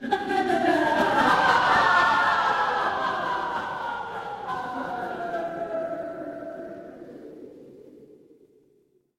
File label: crowd laughter